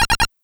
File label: alarm loop